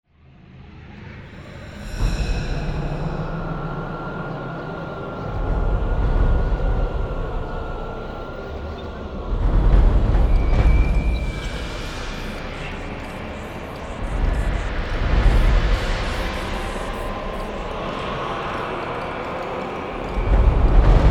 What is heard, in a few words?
Space Atmosphere; Space Atmosphere 1